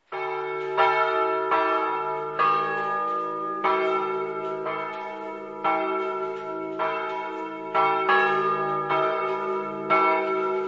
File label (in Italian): polcenigo, campane